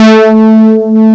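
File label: brass ensemble, analog; CMI Factory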